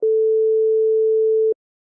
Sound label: calling